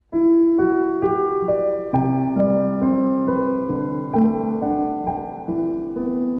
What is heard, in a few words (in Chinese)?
counter attack 钢琴版